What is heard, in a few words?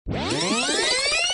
rewind